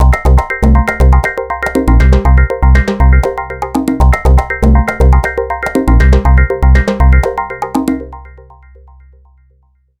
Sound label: music intro; SkiAlpin2005